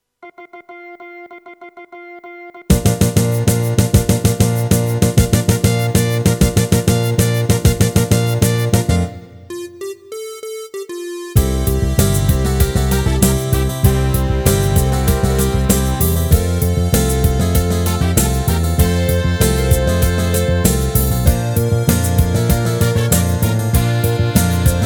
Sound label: Musicbox